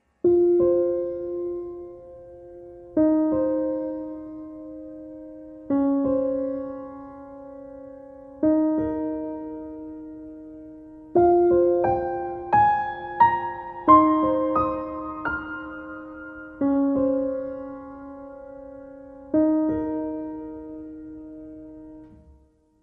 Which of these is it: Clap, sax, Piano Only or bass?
Piano Only